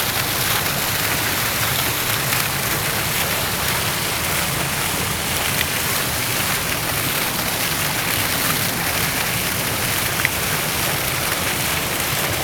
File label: Fountain G, Drone